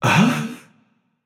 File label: surprised, voicepack; smite; jorm